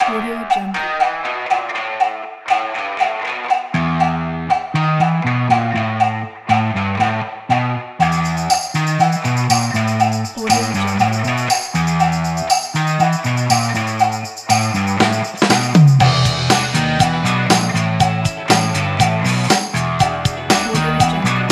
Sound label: retro rock groove